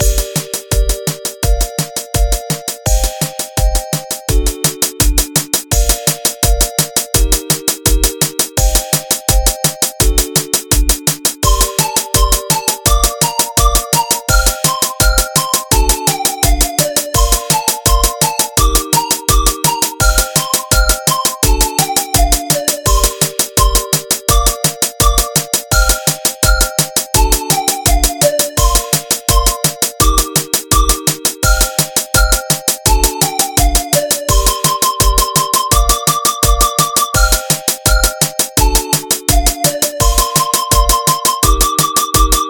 Energetic; Emerald Shores; PS Vita

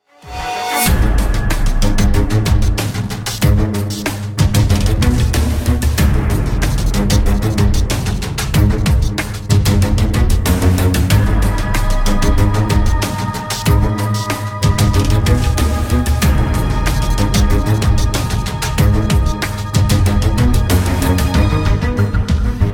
BGM battle Normal; witch spring 2 android ios mobile gamerip 2016

